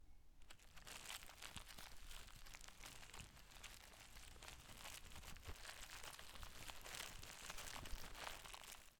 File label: Mud Squelching